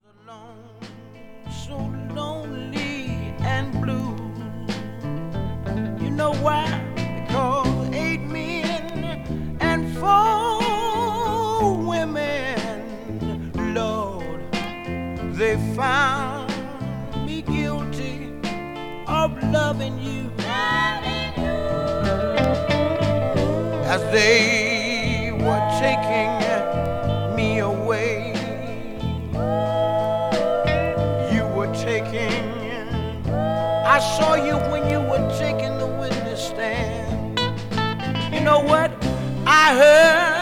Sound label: BACKBEAT